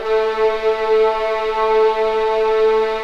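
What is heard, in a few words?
A3 legato piano, violins; East West; The Ultimate String Collection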